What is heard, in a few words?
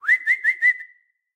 c whistle; willyshotchillies